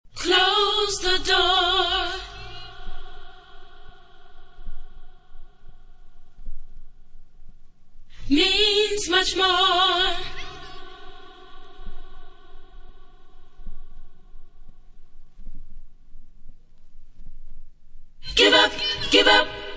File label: original background vocals acappella